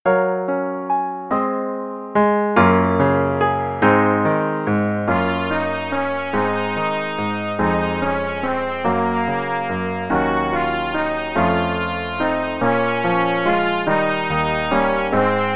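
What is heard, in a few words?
Play-a-long, in Db, both parts, quarter note = 144, trumpet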